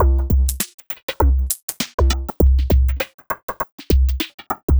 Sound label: perc 100, Percussive Loops, 100 bpm; crumb